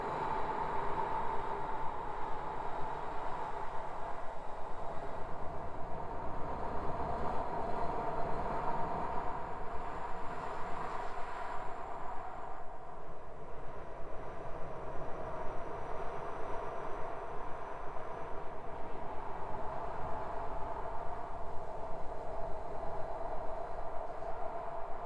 Looping Gentle Wind Ambience On An Open Desert Plain